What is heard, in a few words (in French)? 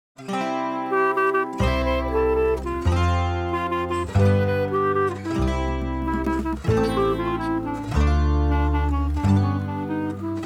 version instrumentale